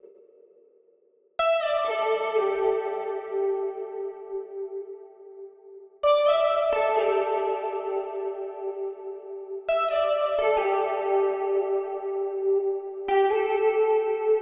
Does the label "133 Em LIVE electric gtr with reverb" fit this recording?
Yes